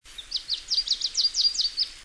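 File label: Yellow-rumped Warbler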